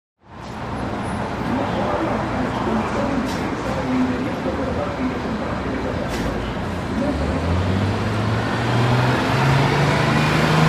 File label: Airport Temporary